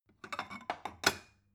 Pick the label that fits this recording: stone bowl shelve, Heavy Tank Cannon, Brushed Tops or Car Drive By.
stone bowl shelve